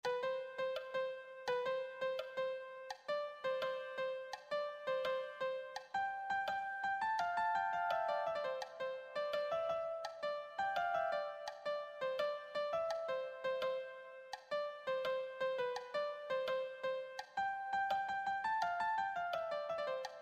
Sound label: melody